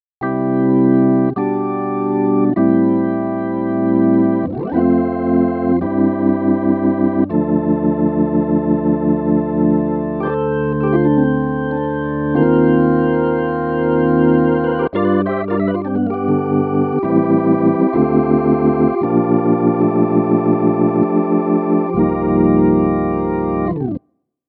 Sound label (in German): Roland Orgel